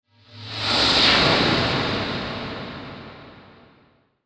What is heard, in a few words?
Whoosh High Reverb; Blastwave